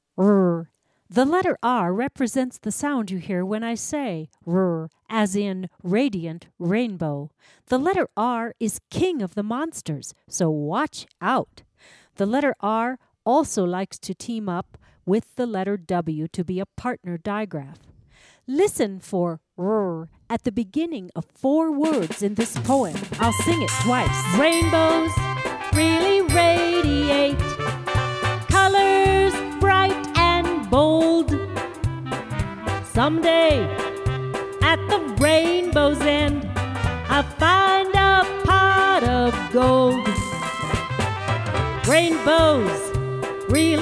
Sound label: triangle